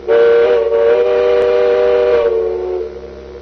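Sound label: Parovoz sound, steam locomotive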